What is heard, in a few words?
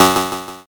zap